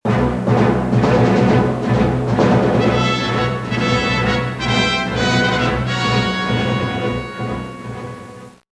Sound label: Fight attack music; Star Wars; Star Wars Misc